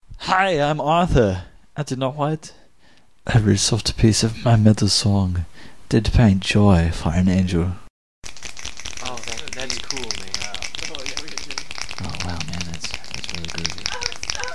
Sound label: metal song poem